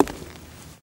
FS Male On Hardwood, singles wood

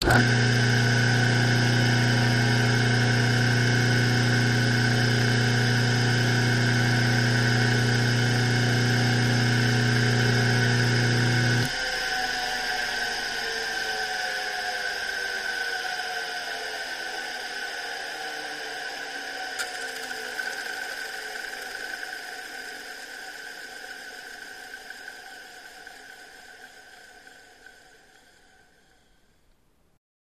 Machine Wind Down, variable speed

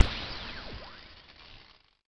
ELECTRO BLADE CANON, lasers

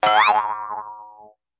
Ping A Boing, boings

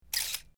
Camera Shutter Moto; shutters & movement

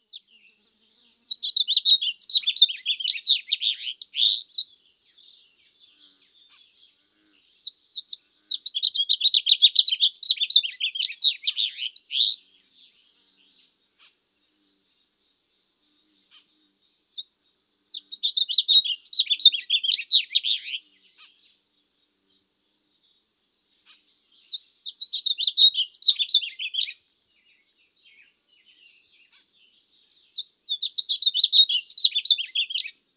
Finch House Chirps, country

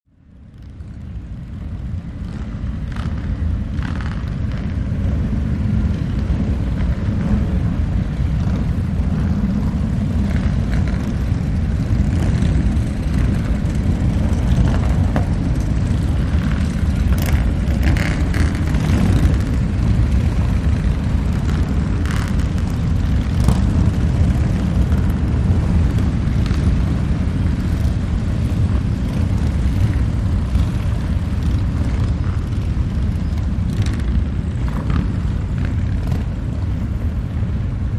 Multiple Motorcyle B, harley davidson

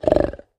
Leopard Breath Snort; big cats

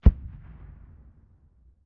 60MM MORT EXPLOSIONS, mortars; 60 mm Mortar Explosions 2

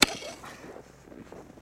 Dig Sngl Ext Effort, shovel

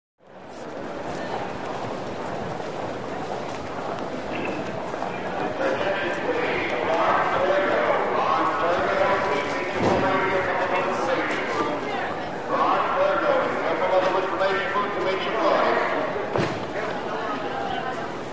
Vendor Hallway Arena, lobbys

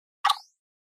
Button Hollow Wood Ta

